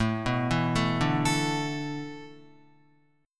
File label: A Suspended 2nd Suspended 4th down Guitar; Asus2sus4 arpeggiated